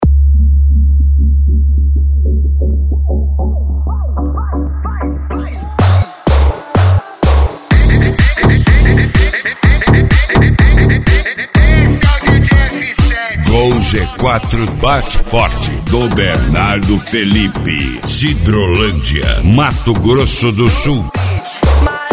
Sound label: MegaFunk